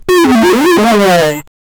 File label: wolf howling at full moon; wolves